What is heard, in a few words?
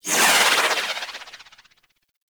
Void Angel Corruption Healing Proj; Abilities; Warframe; Zariman